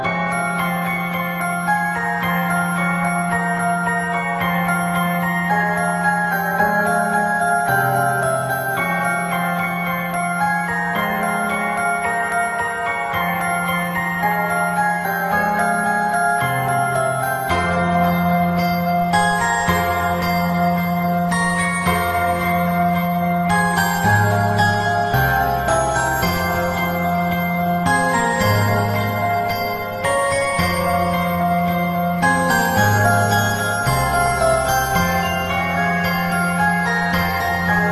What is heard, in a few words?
bgm